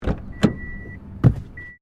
Car Toyota Corolla 2009 door open exterior, hatchback; Cars; Vehicles